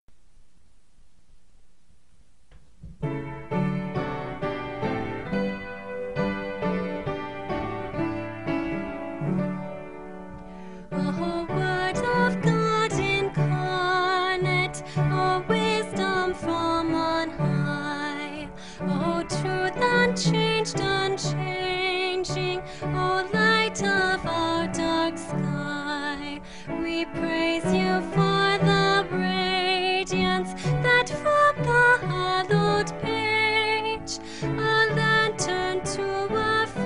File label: choir